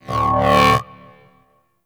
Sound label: Minigame Begin; Corpus